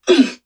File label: pain grunt, Human; Titanfall 2; Pilots